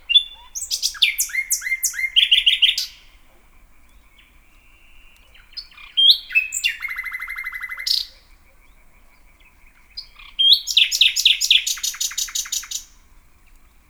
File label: luscinia megarhynchos, bird